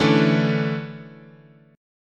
D Major 7th Suspended 2nd, chord

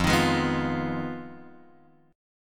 F Minor 7th Flat 5th F; Fm7b5 Chord {1 X 1 1 0 1} strummed

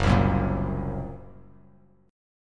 F sharp Major Flat 5th F sharp; strummed; 2,3,4,3,x,x,x,x

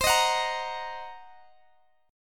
C Minor 7th Flat 5th Guitar DADGAD, chord

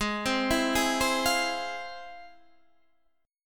F Minor Major 7th, G sharp

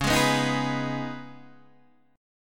C sharp 7th Suspended 2nd C sharp Guitar Open D; down; chord shape

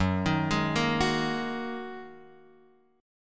Gb 6th Add 9th Gb down Guitar Open F; arpeggiated; Gb6add9; JGuitar

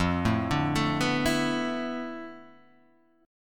D Minor 6th Add 9th F, guitar chord

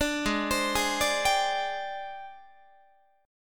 G sharp Major 7th Flat 5th Guitar; G#M7b5 Chord

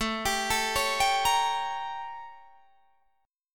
A 7th Flat 9th Guitar Open D

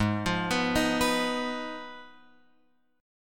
Ab Minor 6th Ab, down, Guitar, Open F; arpeggiated; JGuitar

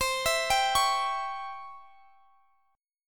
Db Minor Major 7th Flat 5th C

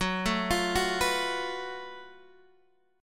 Gb Minor Major 11th Gb down Guitar Drop D

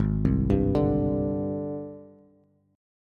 Ab Major Sharp 11th Ab, Bass Bass Standard; AbM#11; 4,3,5,7; arpeggiated; chordshape; JGuitar

